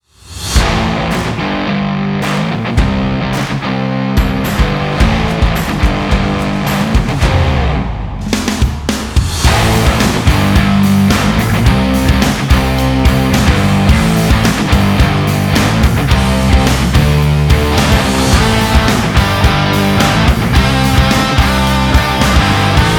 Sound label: hard rock